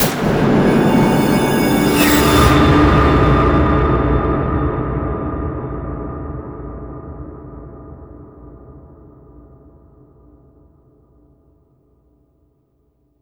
sci-fi sting; Spectrasonic Distorted Reality 1

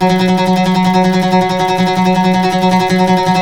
TREMOLO, Turkish Oud

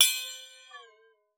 WATER, PERC; Xsample VOL-9

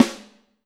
Snare, Alesis DM5

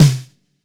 LUDWIG SNARE

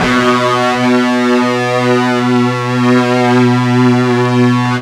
FUZZ B 1, ULTRA FUZZ, Guitar; East West; Steve Stevens Guitar Samples Collection

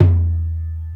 Surdo Hit; Drum One Shots; Percussion; Ambient Grooves

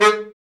BRA BARI, Bariton Sax

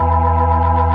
B3 E 4, organ; AKAI S-Series CD-ROM Sound Library VOL-1

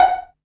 PIZZ, Dance Mega Synth

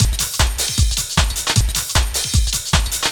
LOOP, Eurotech; Sounds Good

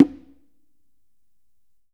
CONGA MUFFTO; Big Fish Audio; Ross Garfield Drums VOL-2; GM PERCUSSN

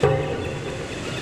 rhythm effect, Drum N Bass; Sonic Foundry (Sony Creative Software); Knutrix Drum & Bass

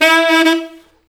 SAX A. STAB, Alto Section; Roland L-CD702

